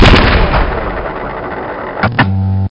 fire minigun

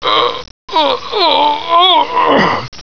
scientist die forwards

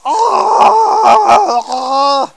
death; chatsounds

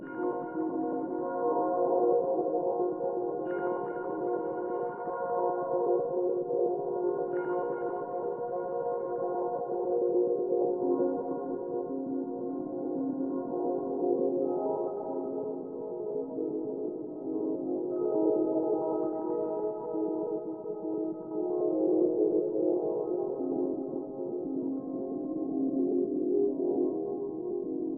digilab calm, music; sven

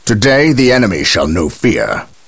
cheer; gabriel angelos

